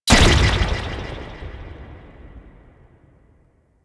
Golden Band Staff Slam B; Warframe; Monkey King

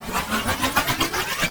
Archon Whip Block End; Enemies; Weapons; Warframe; The New War; Nira

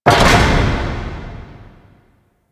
Temple Tunnel Door Open B; Warframe; Harrow; Red Veil Temple

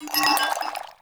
Vial Foley; Warframe; Lasrian; Lasrian Soldiers; Footsteps; Lasrian Goonadier

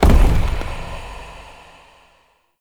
Dodge Trap Spawn; Characters; Enemy; Abilities; Circade

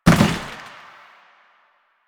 Janitor Cart Impact Short; Warframe; Ambience; Nineteen Ninety Nine

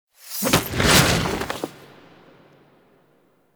Scythe Pull; Stalker Scythe Pull A; Warframe; Quests; The New War; Sealab; Hunhows Lair; Hunhows Lair Part One

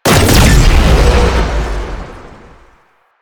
Corpus Projectile Shot; Warframe; Jade Shadows; Ascension

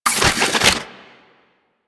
Fist Spin; Enemies; DE; Warframe; Tyl Regor

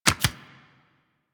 Tarot UI Card Click C; Warframe; Harrow; Tarot Emotion Choice

